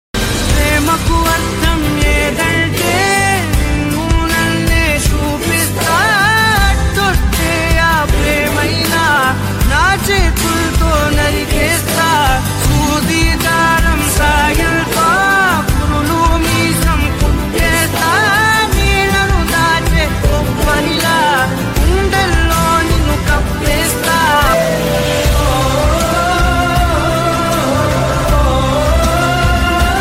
Romantic Telugu Love Song Ringtone

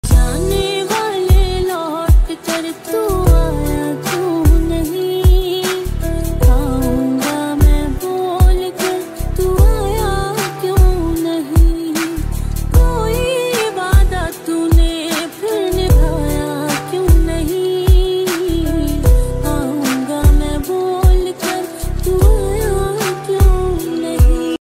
Female Version; ringtones

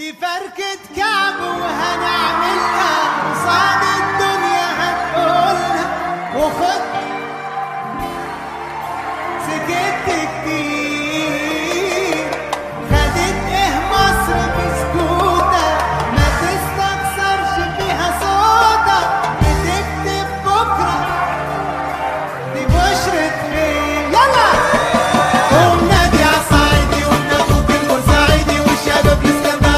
Arabic Song Ringtone

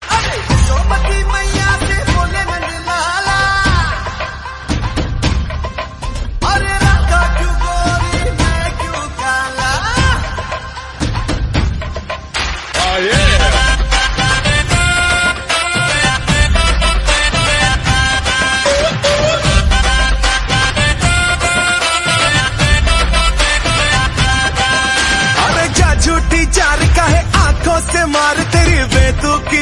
Bhajan Ringtone

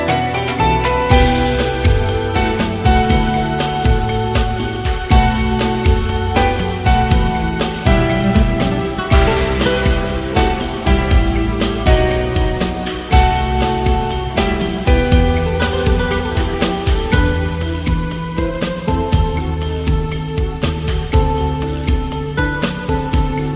Instrumental Music Ringtone

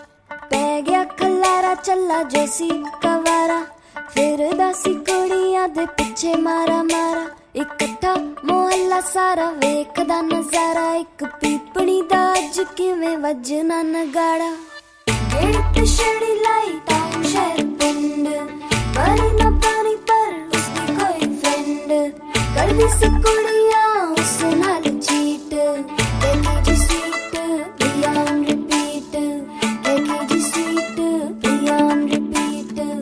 Punjabi Ringtone

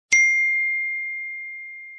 iPhone Message Ringtone; ringtones

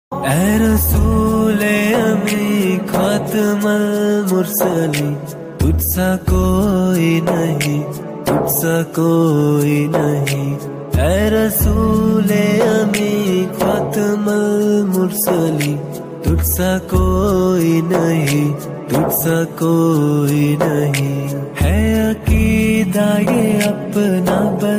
Islmaic Ringtone

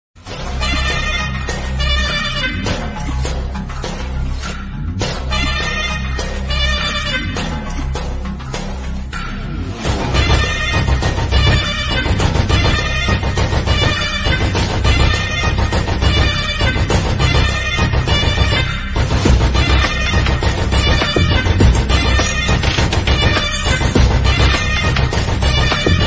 Theme Music Ringtone

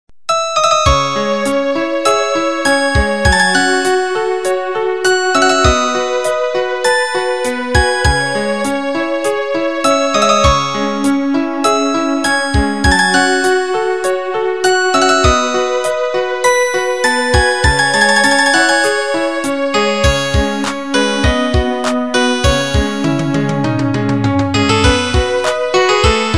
New Romantic Instrumental Ringtone; ringtones

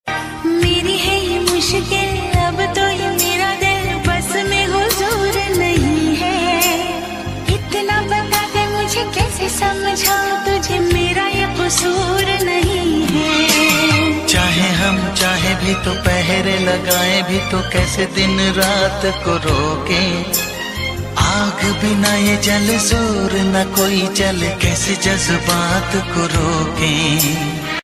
Old Ringtone

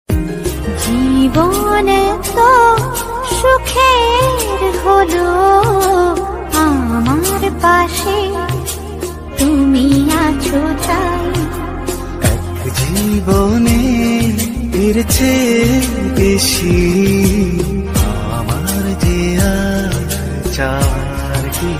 Bengali Very Sad Emotional Ringtone; ringtones

